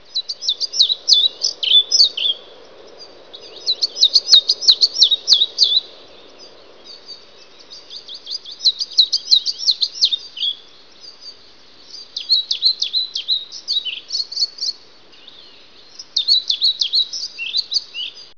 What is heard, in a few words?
Ficedula hypoleuca